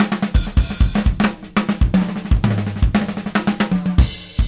natural fill, DRUM LOOPS and BREAKS, FILLS and ROLLS